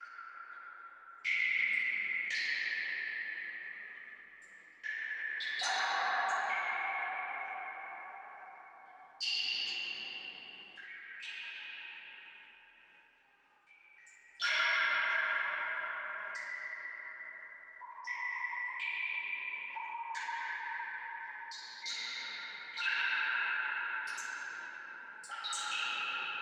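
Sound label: Ambience drops; game builder garage 2021 switch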